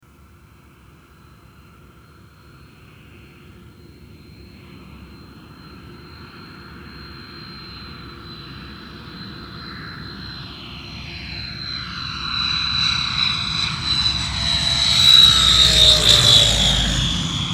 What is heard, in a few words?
YS-11